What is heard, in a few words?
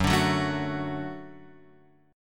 F sharp Minor Sharp 5th, Guitar, Open F; strummed; x,1,2,1,0,1